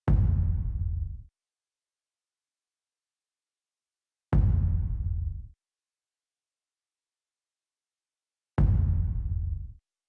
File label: Round Two Loop, Music, Player Instrument; Arbinn Hills; Deora